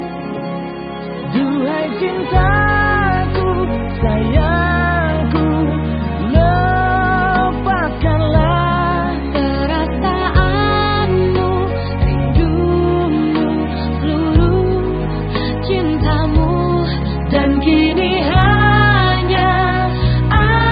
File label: wedding song